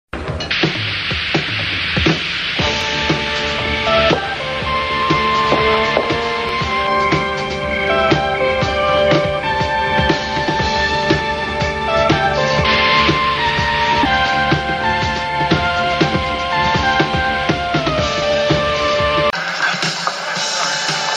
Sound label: Dramatic theme